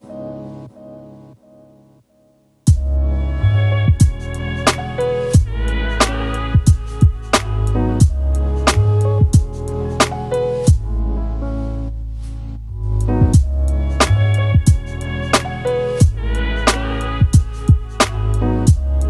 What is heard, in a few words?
lofi hip hop